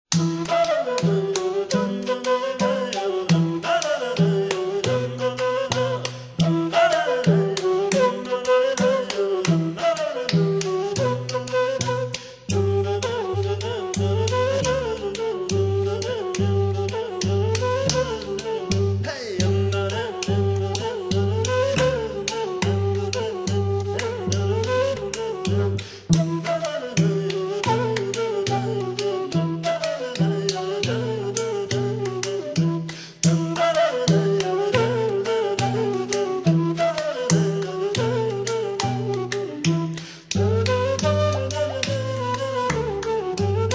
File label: bulgarian tune